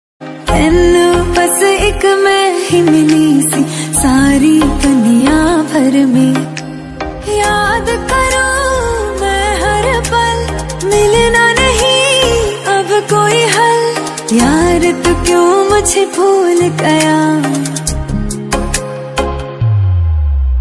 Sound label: female version sad ringtone, bollywood ringtones